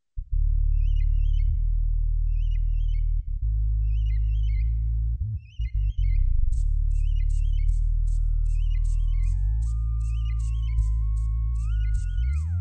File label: k2600 modern trance demo